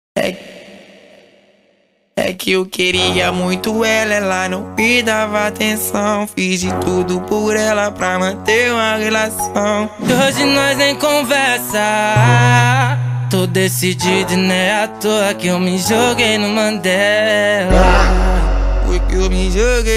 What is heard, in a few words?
brazil music